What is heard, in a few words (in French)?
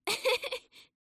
Grenouille Rires Courts; mirumiru